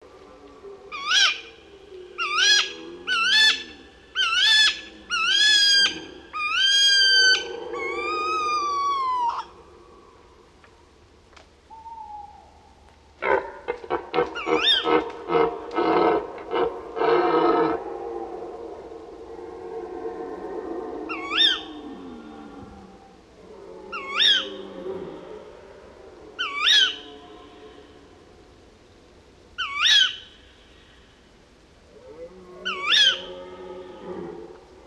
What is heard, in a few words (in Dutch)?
Burlen concert